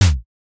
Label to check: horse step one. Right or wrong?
Right